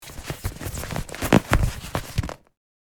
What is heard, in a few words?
Inflatable armbands handling friction